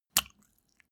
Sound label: step barefoot wet hard